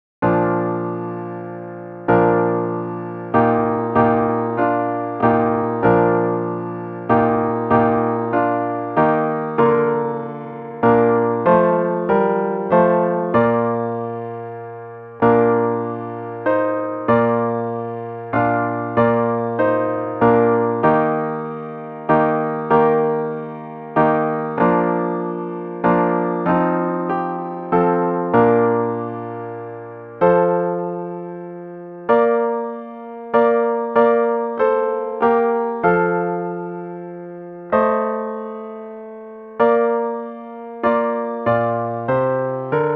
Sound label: HARP